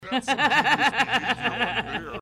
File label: laugh